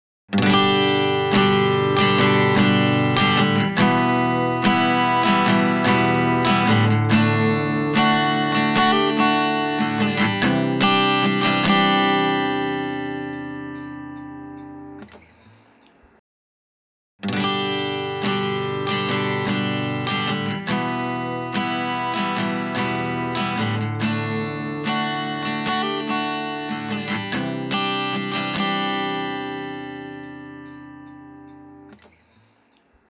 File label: AC Guitar Retro Console EQ 12kHz